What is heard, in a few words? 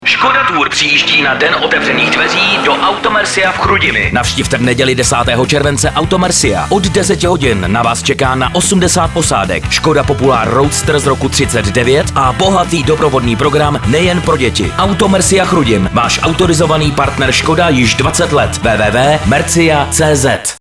Beat spot